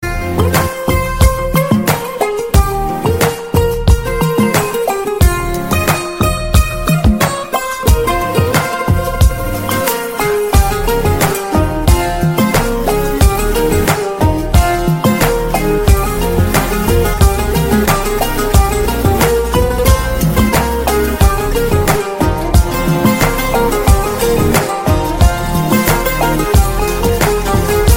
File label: Melody Tone